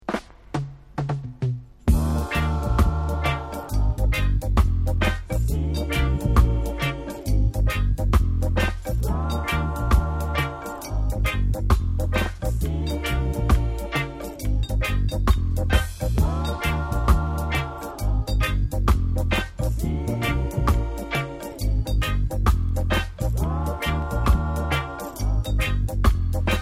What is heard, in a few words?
Rock Reggae